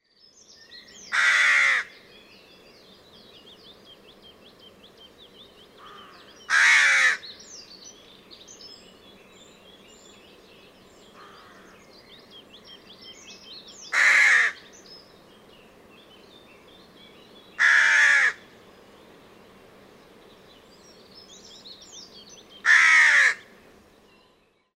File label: rook